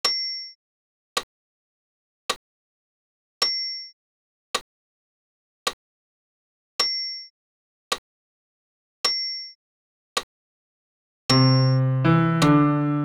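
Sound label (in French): Sanctus basse piano seul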